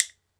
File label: WOOD BONE, Celtic Percussion; Drums&Percussion; E-MU Producer Series Vol. 7 – Old World Instruments